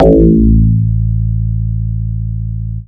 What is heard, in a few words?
dance, bass; Ueberschall; Techno Trance Essentials